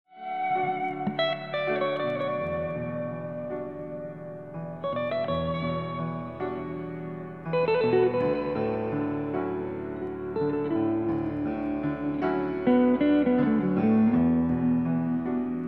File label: piano guitare; Barre au sol & Relaxation